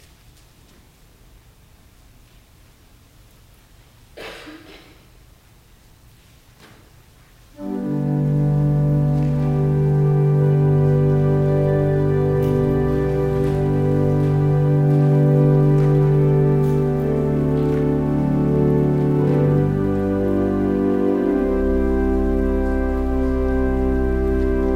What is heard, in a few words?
Organ Music; The Strong Man Overcome; luke 11 21 22